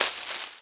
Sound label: impact leaf